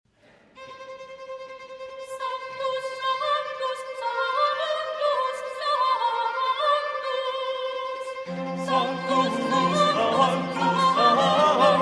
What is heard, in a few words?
hled male jezulatko; Sanctus